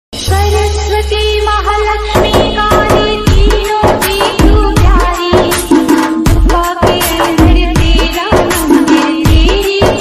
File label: navratri ringtone music